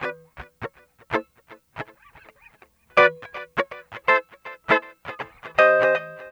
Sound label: GUITAR; East West; Funky Ass Loops